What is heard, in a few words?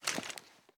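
pistol 1911 fast reload empty lift; gmod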